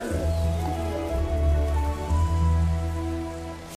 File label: wind and leaves; ori and the blind forest